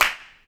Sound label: cistern, Clap n Snap, Snares